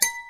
knock

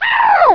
Thing Noise; BOOM

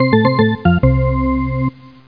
grab ball

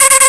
buzz; Bip Boing & autres

